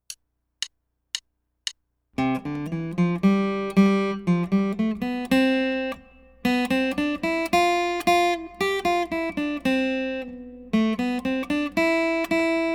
Scale Studies

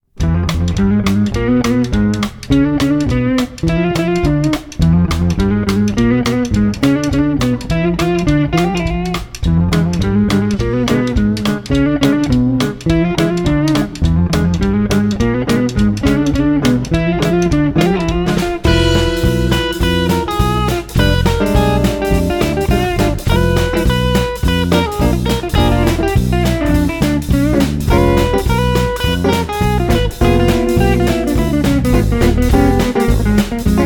whole half diminished

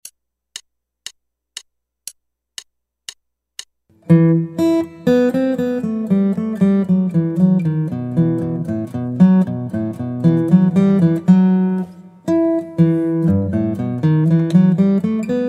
Guitar

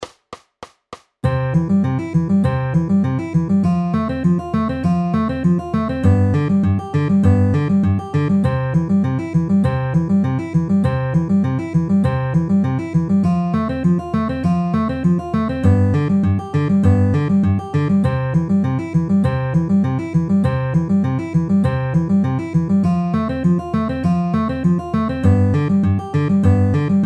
C F G C pinch middle ring at 200 bpm

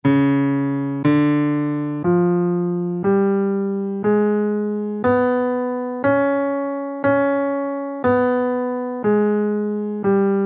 tritone scale in Db, guitar

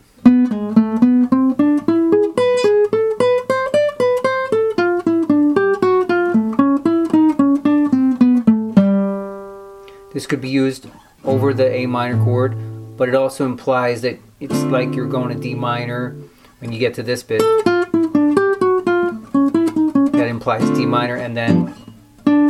jazz licks

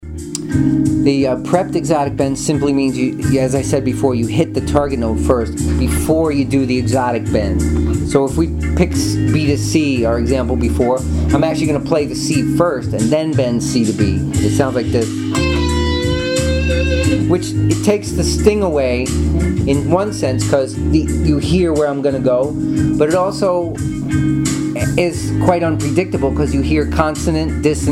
expressive elements prepped exotic bends

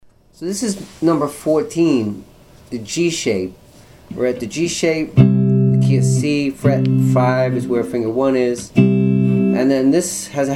string bend lick

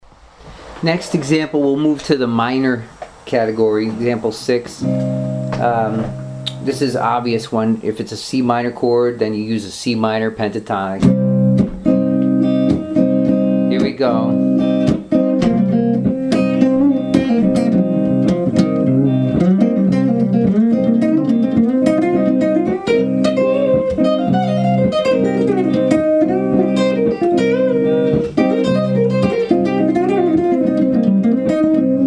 advanced minor pentatonics

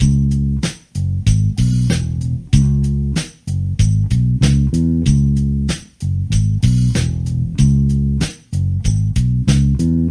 bass lines 2 bar loop

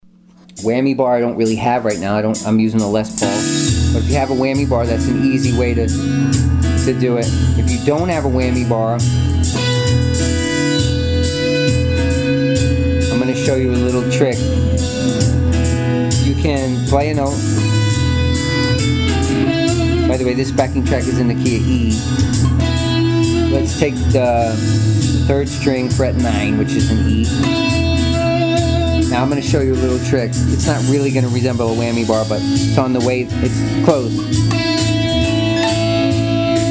whammy bar; expressive elements

